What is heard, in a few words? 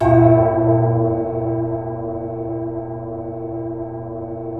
space bass C; InVision Interactive; Lightware VOL-1; Instruments & Percussions; DEMBY